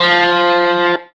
electric guitar

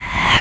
spooky, notes

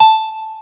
piano

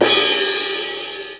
drum

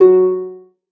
lyre

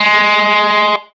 electric guitar

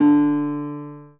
spanish guitar

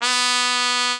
mexican trumpet

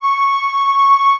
flute

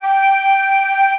flute

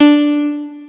piano

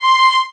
violin

